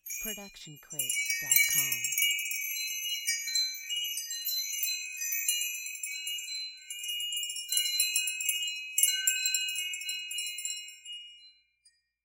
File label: Wind Chimes B; SoundsCrate